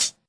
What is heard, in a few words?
classic hh; Hip Hop; STYLE KITS; Classic Hip Hop Mega Kit